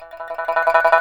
SNXN TRML E4, Heart of Asia; Spectrasonics

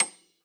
piano C7, Honky Tonk ff; Best Service ProSamples vol.53 - Classical Prepared Pianos; Total Piano

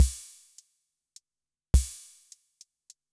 waltz, Yamaha MR-10

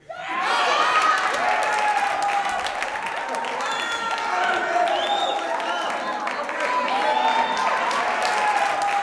JUBILATION, Gospel; Spectrasonics; Vocal Planet

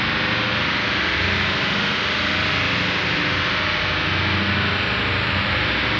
Panned Noise, non tempo loops; stereo toolkit samples

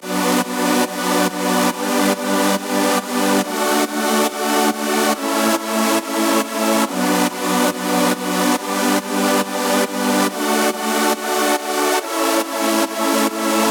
140BPM B; Vengeance - Essential Clubsounds Vol.4

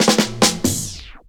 Roll Stop, Drum Rolls; Zero-G Jungle Warfare 1, 2 & 3; 90 s Sample CDs

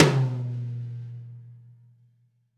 Tom, Percussion One Shots; Essential Percussion

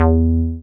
303, TB-303 BASS

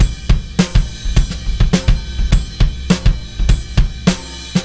103 bpm, breaks; Bell End; Zero-G Beneath the Planet of the Breaks

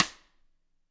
GRCH STK, GRETSCH SNR; ILIO; Double Platinum Drums 2

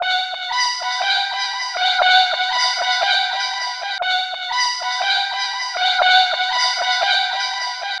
Terraforming Whisper; Sonic Foundry (Sony Creative Software); Club Joints and Street Anthems; Drop Top

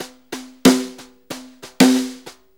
Brushes 8ths Snare; Sonic Foundry; Sony Creative Software; Loops & Samples Drum Tools

